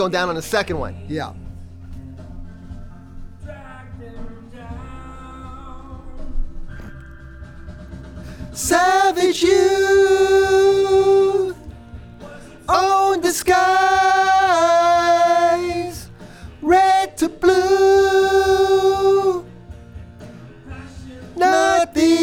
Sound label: VOX Back